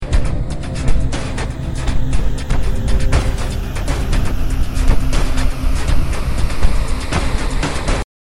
caveman intro music

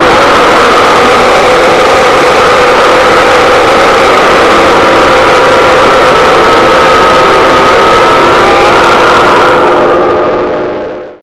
jumpscare sound; one night at mins

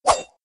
sword slash

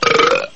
belch

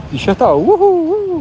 wohooo

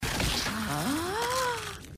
gasp

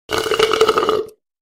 drinking schlurping

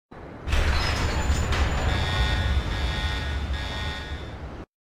nuclear missile launch

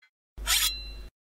sword sound effects

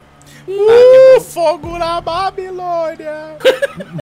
iiiiihhhhhhhh; gta v corridas

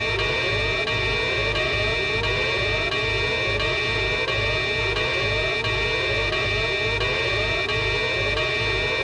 lab alarm; outlast

